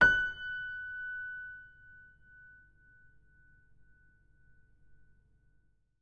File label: F sharp 5, piano